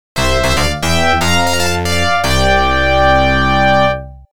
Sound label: All Clear; Gang Busters; arcade; Great Police Power